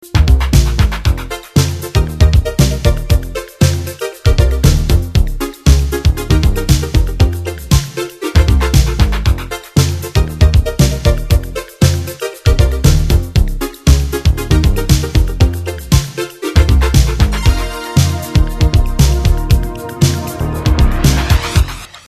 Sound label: original Ringtone